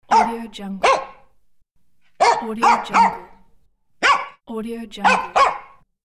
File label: funny dogs bark; djsapa